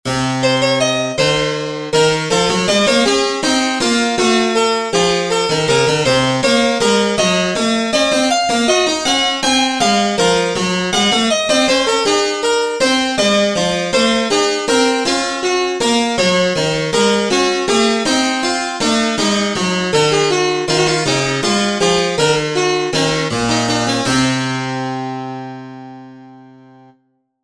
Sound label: Diasem Locrian Example 19edo